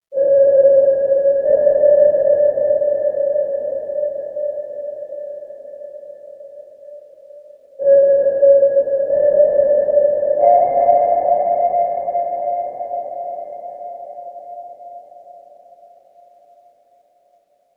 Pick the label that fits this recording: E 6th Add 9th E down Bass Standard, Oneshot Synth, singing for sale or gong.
Oneshot Synth